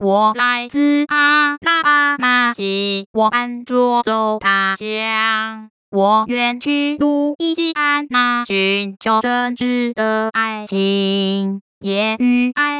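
Synthetic singing voice (female), No coartcltn, Chinese; Mandarin Singing Voice; O! Susanna; (噢! 蘇珊娜); Pitch Coarticulation for Mandarin Singing Voice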